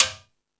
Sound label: Latin Drums, E-MU Pro-Cussion; drum machine